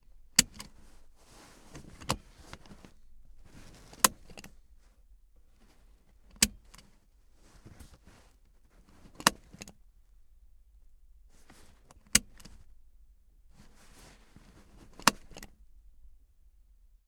toyota c-hr seatbelt latch; Sonniss GDC

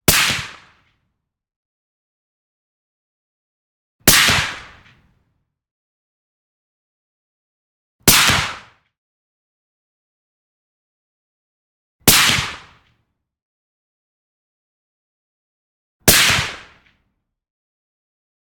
sako trg 22 silencer 1m right clean single shots; Sonniss GDC

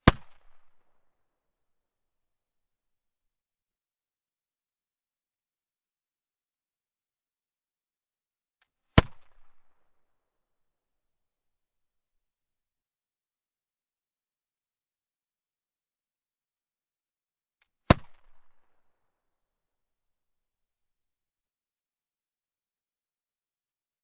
rifled flintlock pistol m1820 firing close; ms decoded vp88; Sonniss GDC

